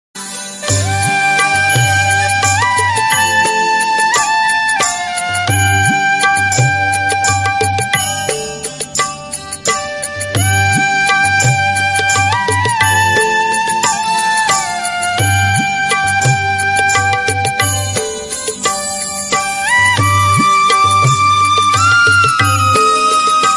flute ringtone instrumental Odia ringtone; shri jagannath

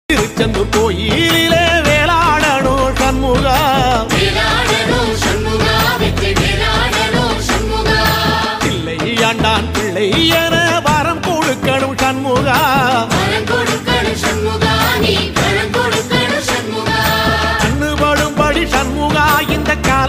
songs ringtone tamil ringtone tamil ringtone song; murugan

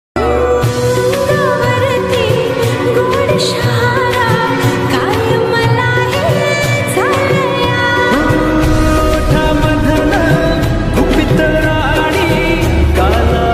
Marathi ringtone

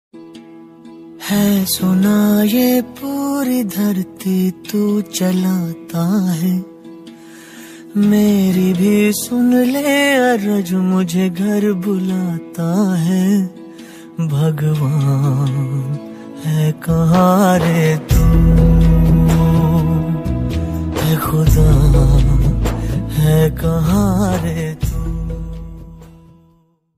sad ringtone; pk movie

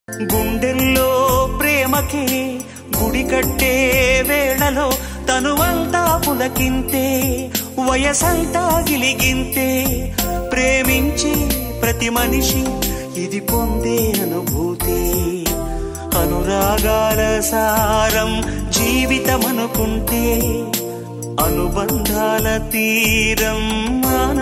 telugu love songs

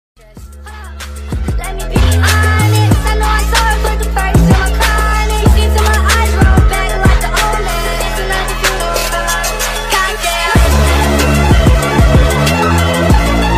nightcore ringtone

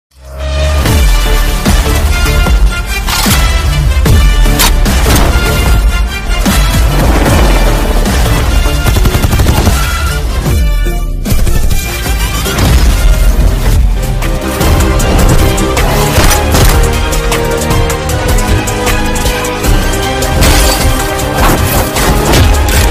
trailer bgm trailer ringtone; thalapathy vijay

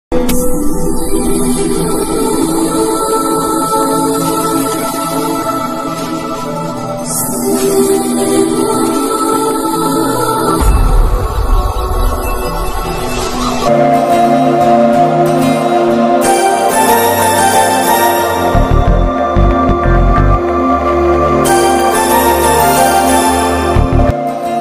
movie bgm music ringtone; Parugu movie

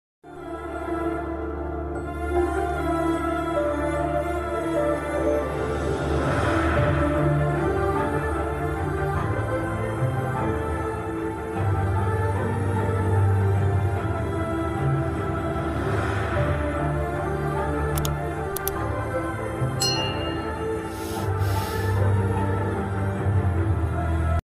ooru peru bhairavakona climax bgm ringtone

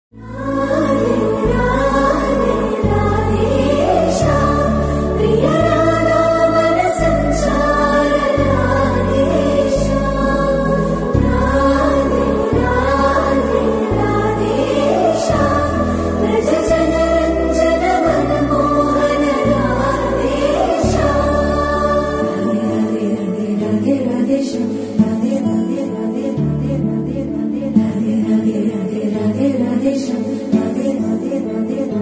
telugu love song

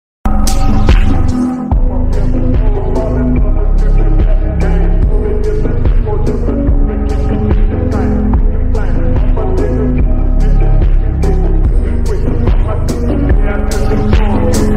slowed reverb ringtone; sigma ringtone

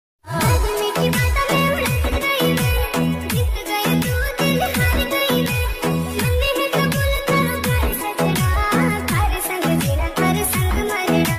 marwadi ringtone, rajasthan

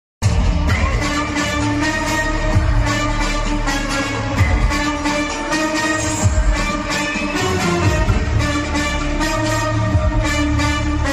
interval bgm

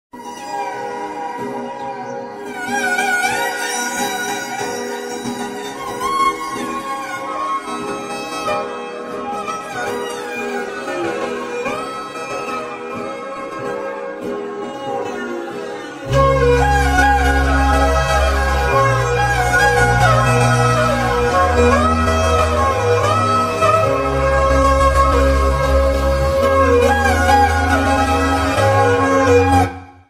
movie bgm ringtone traveling bgm nature bgm

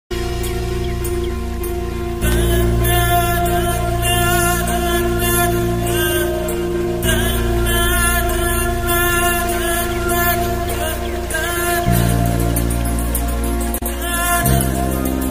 indian bgm voice song

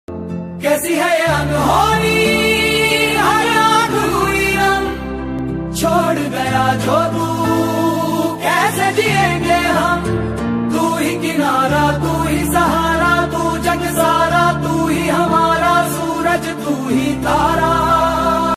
free fire ringtone dj remix

